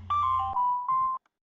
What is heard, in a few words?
Samsung message Ringtone; tonos para teléfonos móviles SAMSUNG